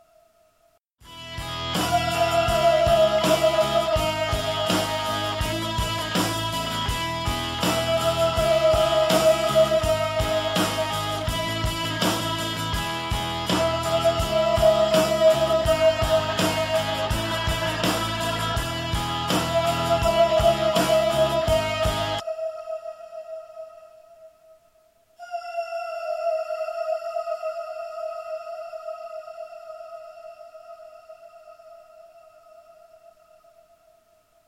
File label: sound effect, theremin